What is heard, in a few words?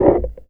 Percussive Hit, contact mic